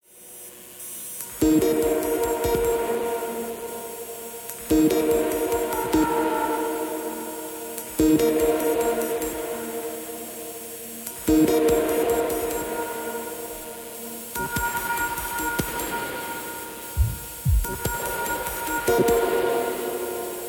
telephone

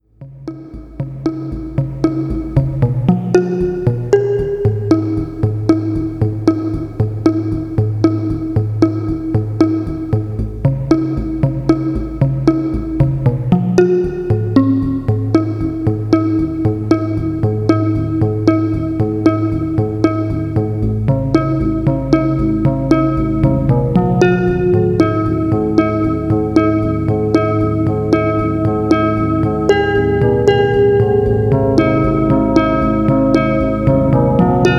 Korg DS-8; One Instrument

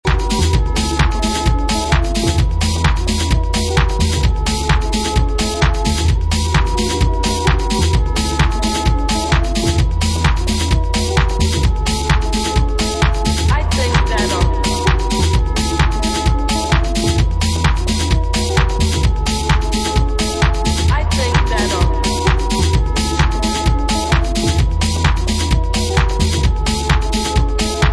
a horn full of jazz

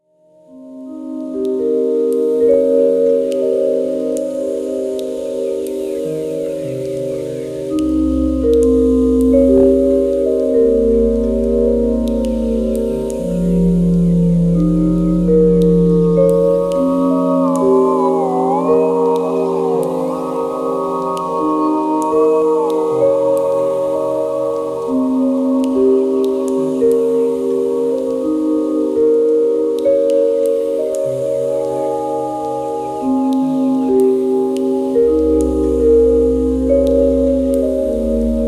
Vibraphone

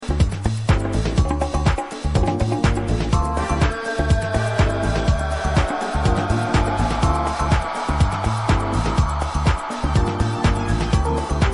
jazz funk freedom; House

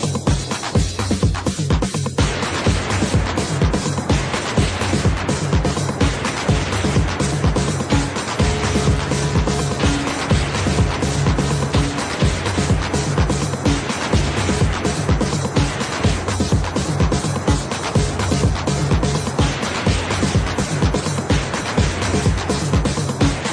acid crash

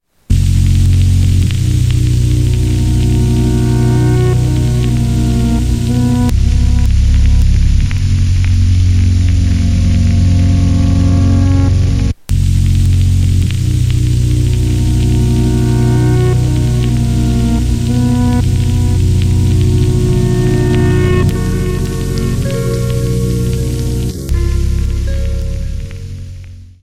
Sans Drums

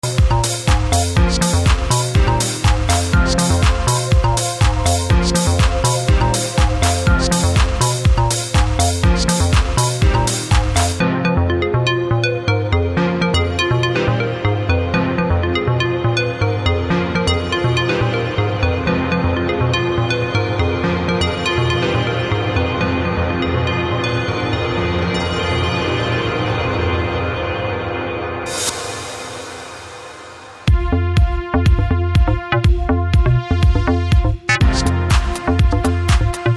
mystic strings

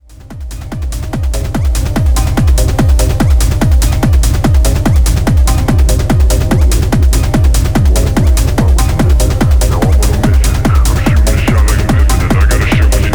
Drumshock

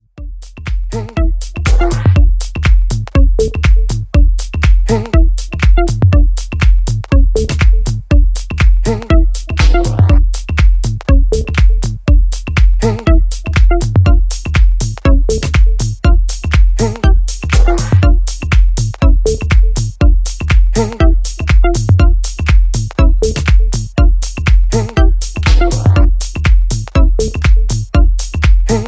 bagpipe woman

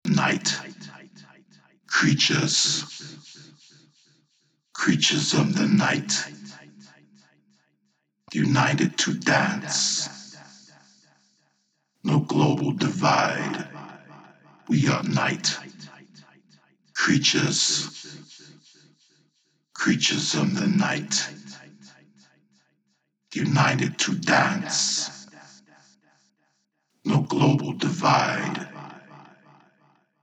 Night Creatures (Acapella)

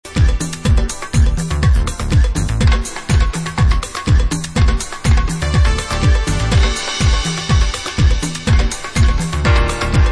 Instrumental

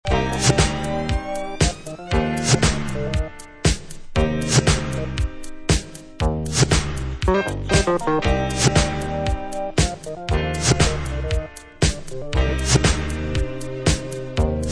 Instrumental; Disco

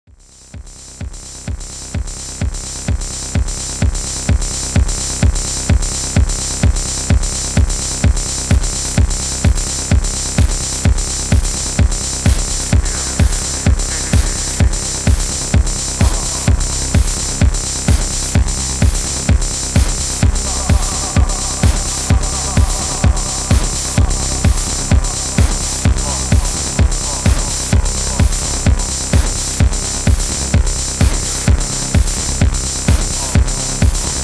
Clap Trap

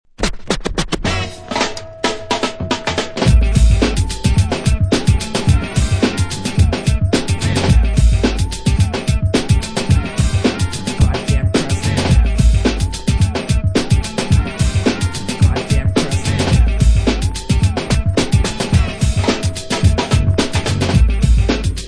vibes (instrumental)

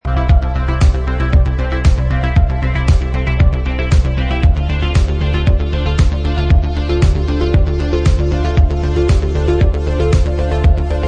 Instrumental; Bpitch Control

